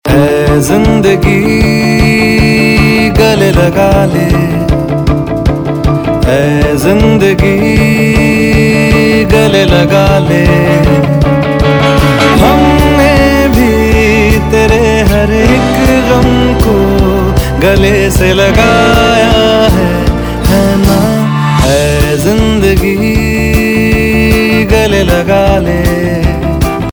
Male Ringtone

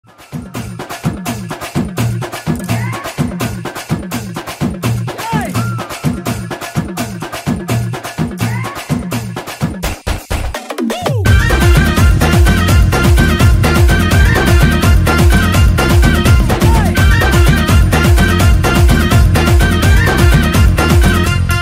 Starting Beats Ringtone; The Paradise Yeshanagula

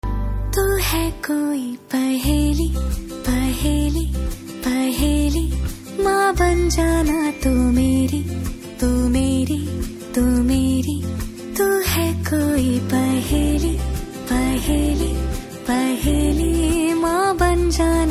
Song Ringtone; Shakunthala Devi

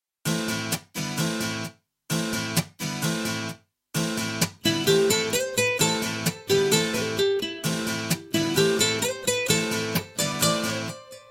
Guitar BGM Ringtone; Jalsa

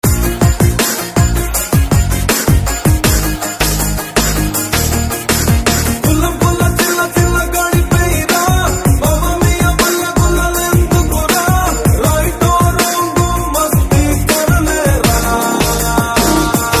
Ringtone

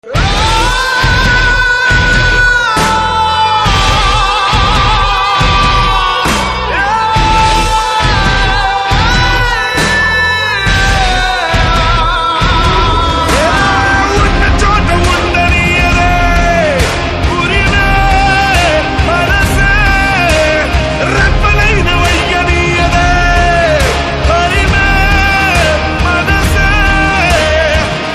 Theme with BG Humming

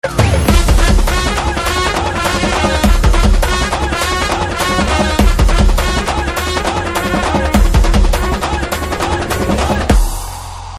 Drum Beats Ringtone

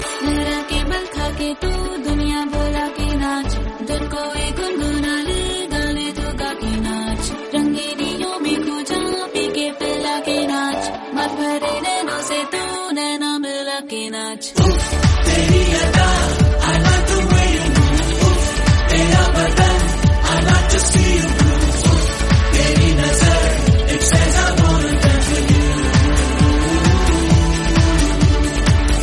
Female Humming Ringtone

